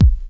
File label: DR. LOOP; X-Static Goldmine 3